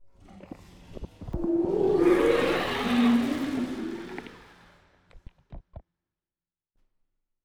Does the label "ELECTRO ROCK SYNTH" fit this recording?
No